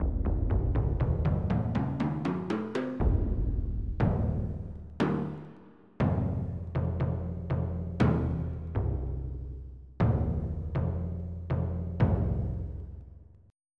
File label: Taiko; FF7